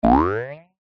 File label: cartoon boing ascend jaw harp; zapsplat